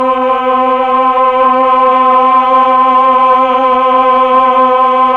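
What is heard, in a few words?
voice Mellotron choir, slow choir; Roland LCDP09 Keys of the 60s and 70s 1; Melotron Vox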